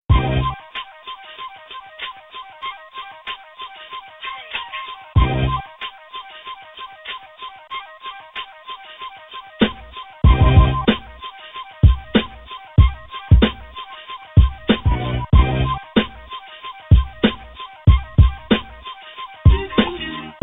beat

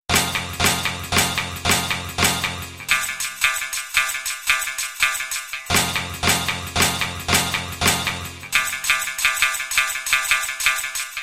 beat

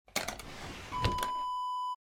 drawer dresser close; Dresser Drawer Close Wav Sound Effect

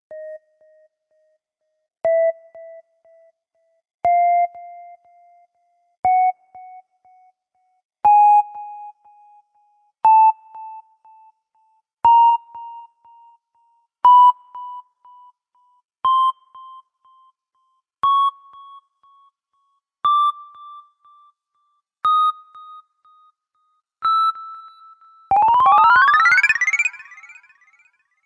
Anticipation Beep Ringtone; Ringtone Records

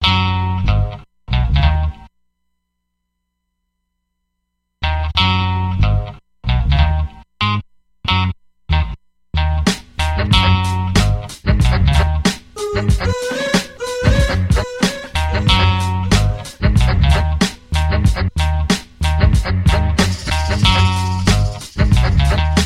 beat

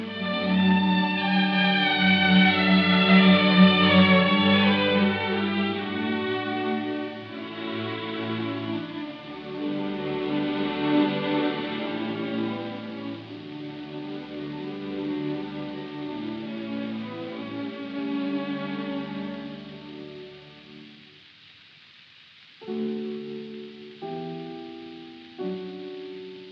Piano Concerto; Mozart Piano Concerto No 12 in A K.414 2nd mvt Louis Kentner

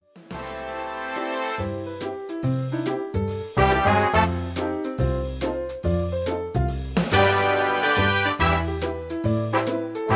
Karaoke Mp3 Track

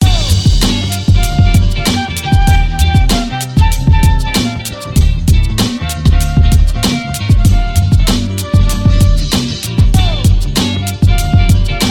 beat

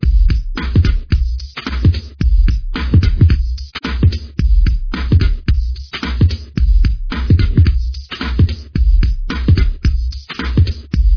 Heat Beats Break; HeatBeats Song17

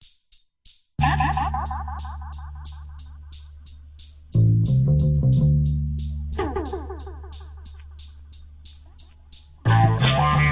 beat

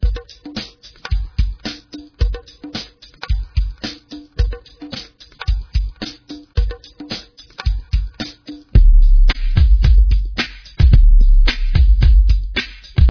Heat Beats Main 110bpm; HeatBeats Song13

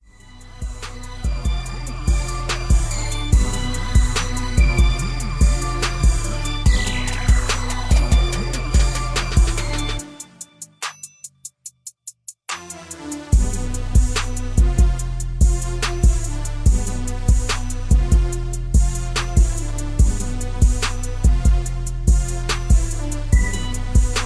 72BPM

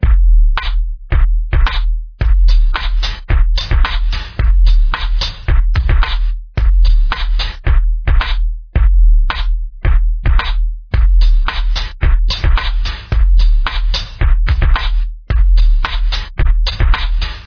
HeatBeats Bridge 98bpm

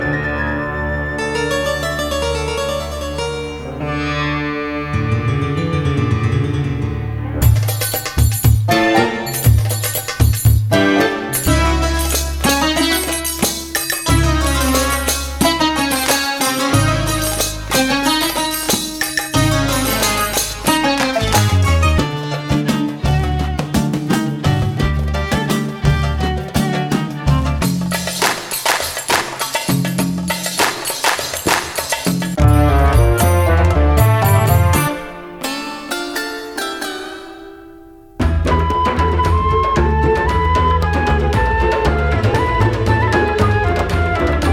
arabian breaks; hard loops

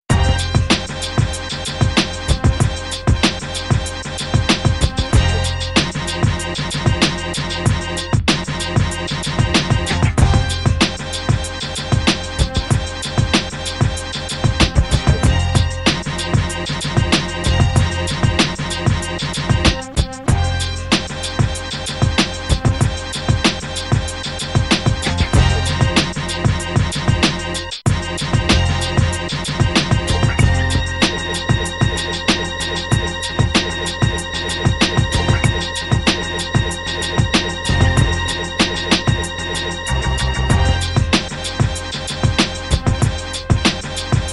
beat

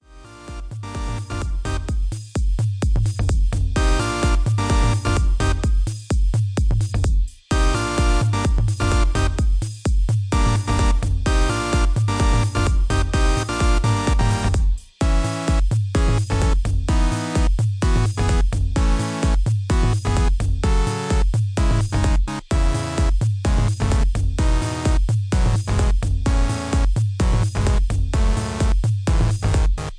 Mini Synth, Ambiance

